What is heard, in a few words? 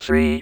wave